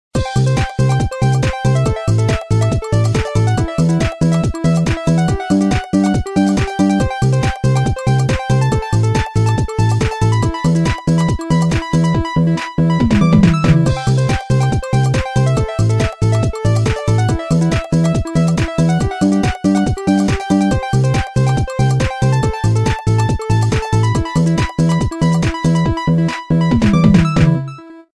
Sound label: Trance; Nokia; Nokia Tones; Windows Phone apps